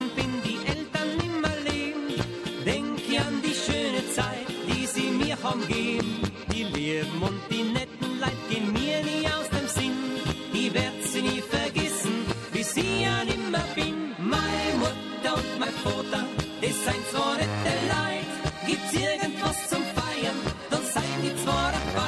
cool austrian folk ringtone

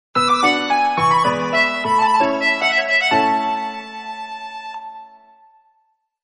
tatar nokia

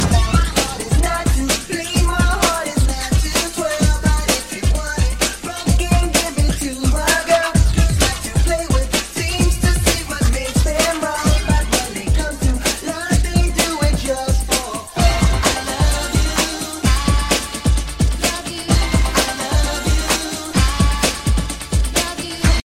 latin freestyle mix, ringtone

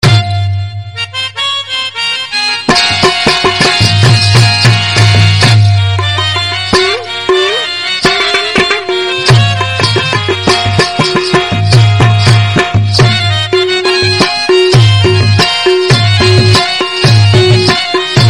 pashto music; ringtone

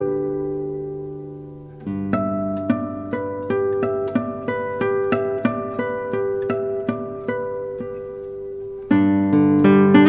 folk song

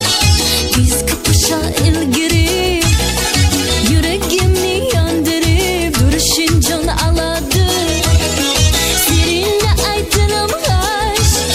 uzbek ringtone

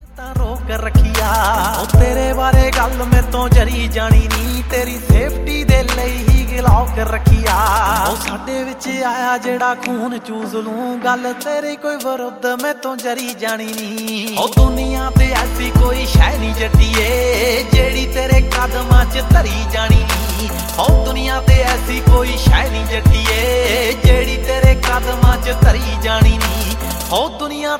old punjabi ringtone

